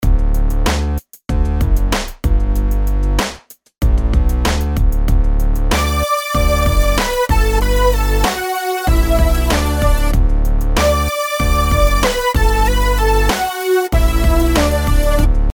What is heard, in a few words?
melodies simple Dmaj; melody